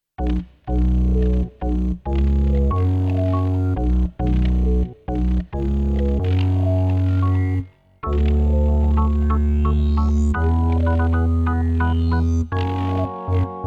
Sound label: synths